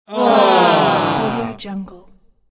disappointed aww small crowd

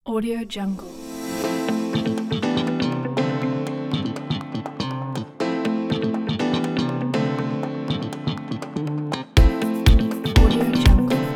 fashion house stylish background music

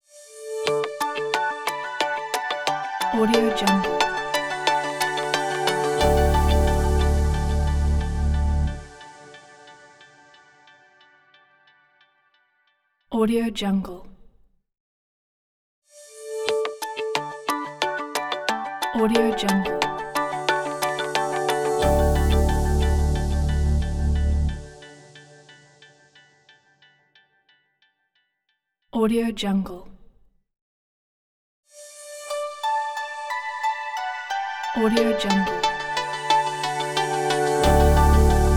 positive medical logo

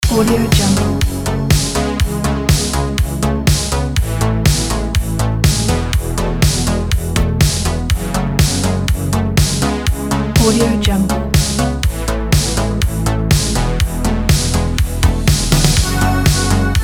synthwave background electronica; boxbeats

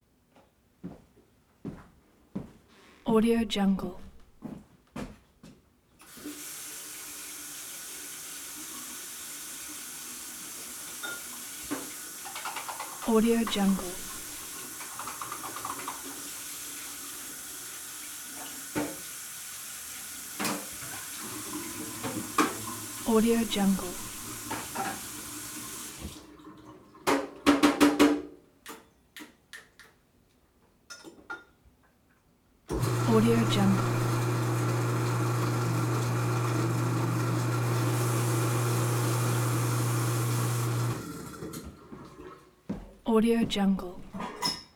party cleanup kitchen single person wash dishes

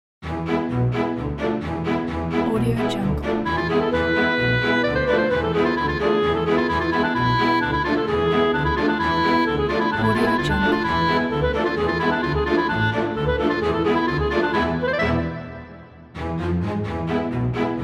jewish dance

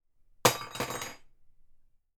spoon drop

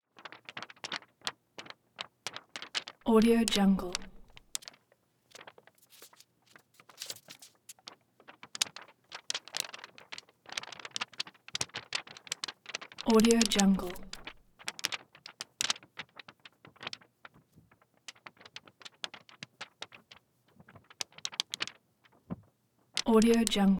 paper spins light movement circular

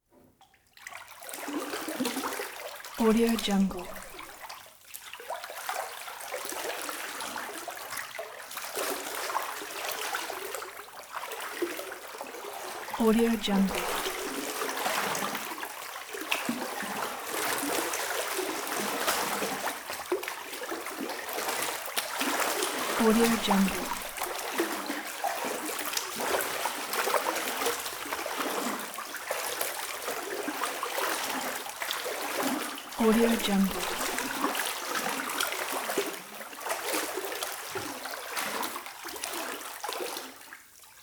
water movement

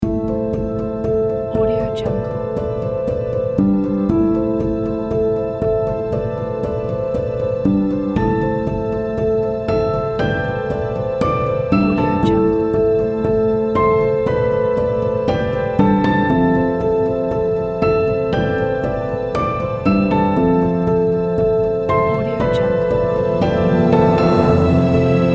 sad romantic trailer

